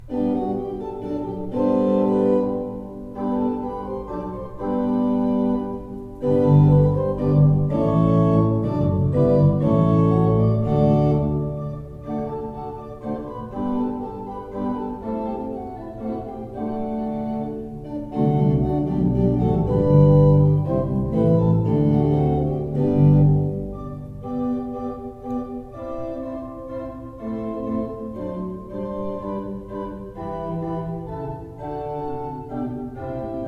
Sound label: pipe organs; Breil 19 II P